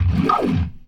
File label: GLISS, ORGAN RIFFS; InVision Interactive; Keith Emerson; The Most Dangerous Synth and Organ